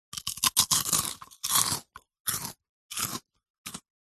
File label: human eat crunch potato chip mouth open